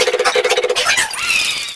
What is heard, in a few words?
Fan Chant; Mario Paint